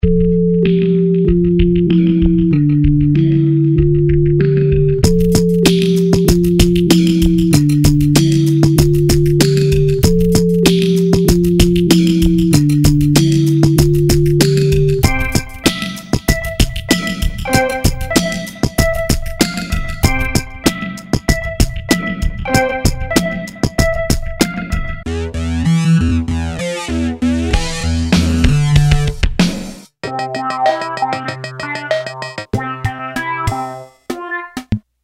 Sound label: toy keyboards